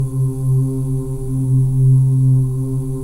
BGV OO; Spectrasonics; Symphony of Voices; BGV OOS 2

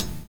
DISCO HH, DISCOKIT; USB Soundscan vol.11 - Drums Dance & Groove; AKAI

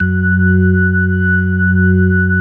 F#2S, B3, Vintage Keyboards

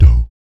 PERC, Vocal, Jazz FX, BASS PERC; Spectrasonics Vocal Planet

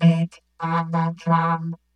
VOCODER FX, VOCAL BITES; Kid Nepro - Elite

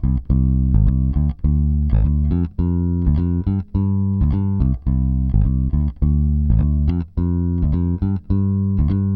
Pop, Bass

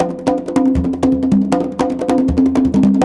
CONGA BEAT; Zero G - Ethnic; CONGA BEATS

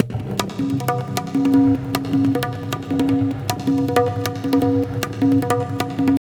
tabla, 155 bpm; Zero-G; Pure Tabla

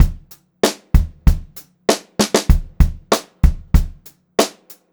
Pop-Rock Drumloops; 96 POP 3

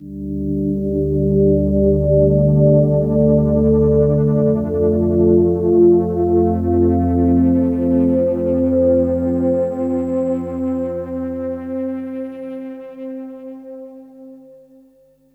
Synth hits and riffs

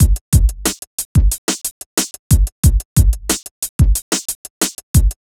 Beat; Sonic Foundry (Sony Creative Software); Infinite Soul VOL-2; Beats

